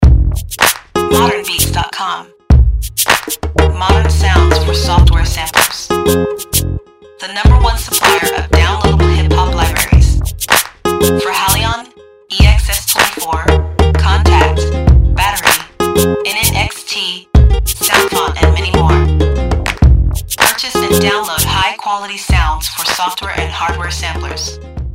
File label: Guitar; GuitarElementz3